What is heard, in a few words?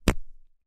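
quill pen and inkwell sounds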